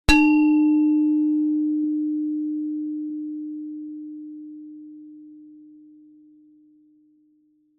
musical instrument gender gamelan single mallet hit mid low